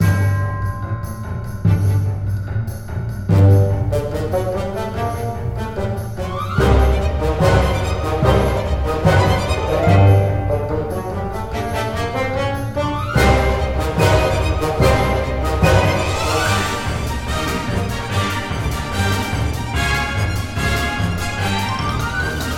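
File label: Orchestral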